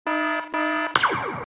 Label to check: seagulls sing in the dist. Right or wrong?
Wrong